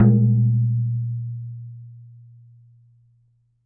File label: Water Container, Kitchen